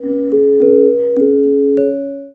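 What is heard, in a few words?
gender toot; Musical Instruments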